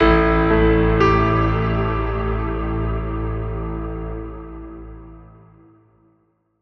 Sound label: Epic Piano Hit - Am 60Bpm; One-Shots; Musical One Shots; Ghosthack; SkyRealm